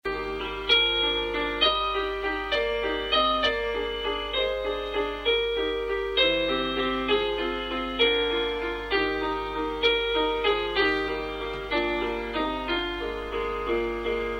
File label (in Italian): chitarra